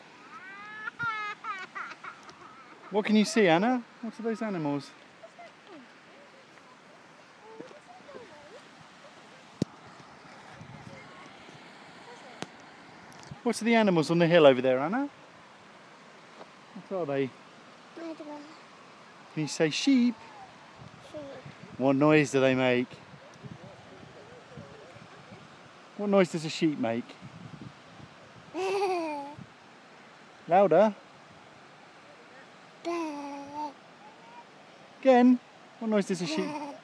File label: sheep near a waterfall